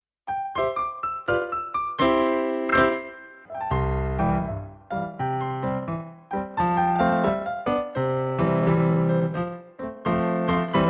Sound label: Club Swinging Music Only